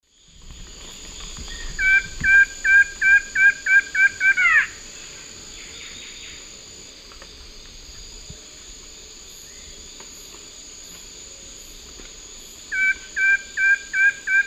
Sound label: Thamnophilus schistaceus, song; S. America; Eastern Lowlands